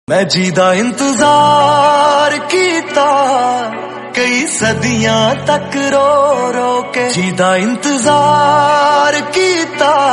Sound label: punjabi song